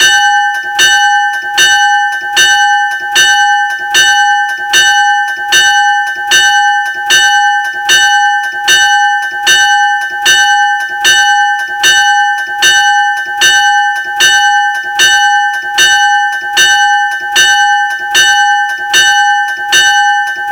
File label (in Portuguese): Sino de Locomotiva